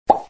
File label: step